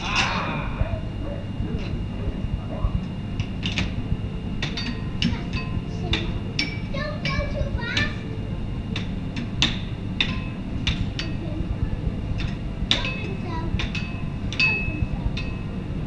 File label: Child In Arcade